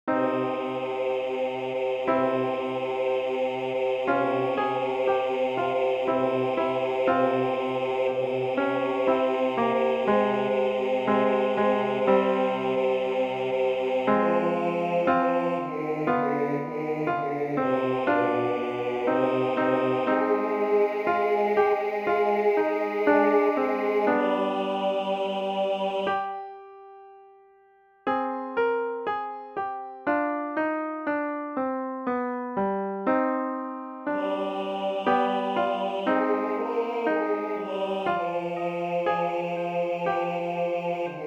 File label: adoramus bajo